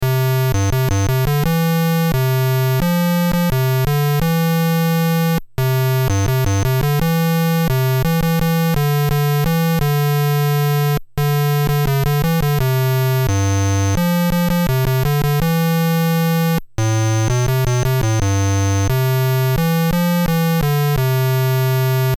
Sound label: I've Been Working on the Railroad (Player Piano); BASIC Manual